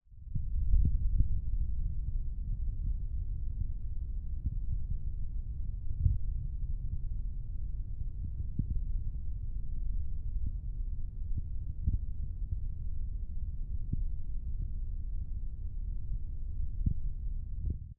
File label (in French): Arbre Contact Fourmi Homme, Friche Industrielle Rhinau